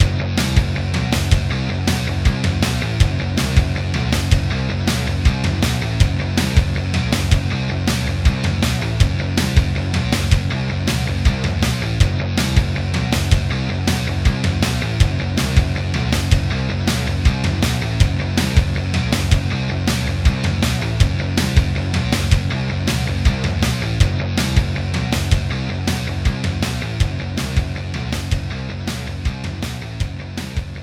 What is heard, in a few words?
3d Music